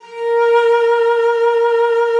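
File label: cello vibrato, A#4; Xsample VOL-7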